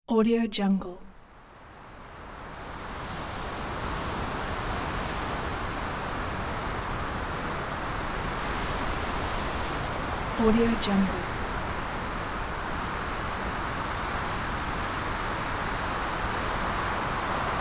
heavy wind

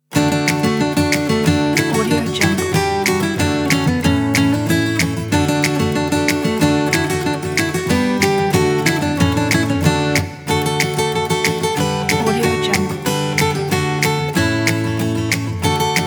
upbeat country acoustic guitar

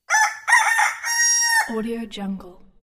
rooster

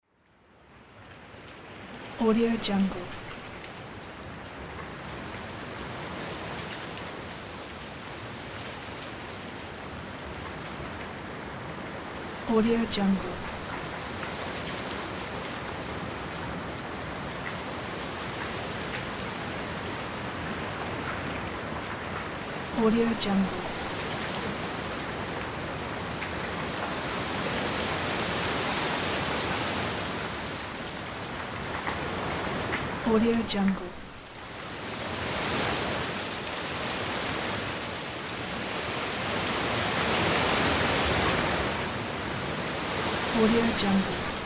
the sound of rain

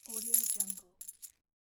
board game plastic coins

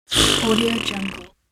blowing a raspberry

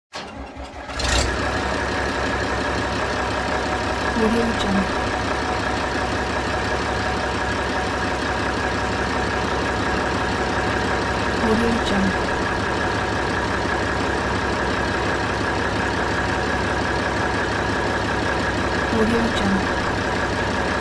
truck engine start

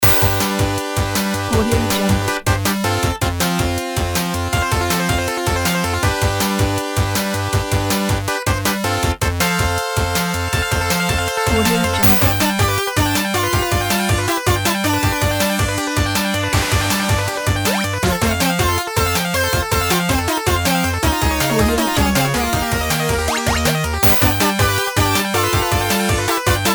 retro action video game

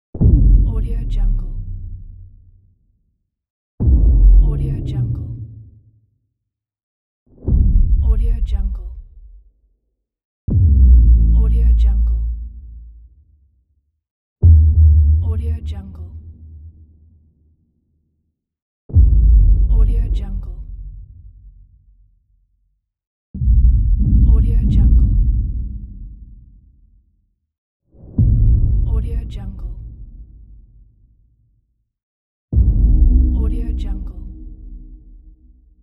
deep bass rumble

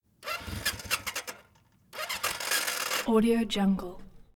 kawasaki z1000 z1r motorcycle

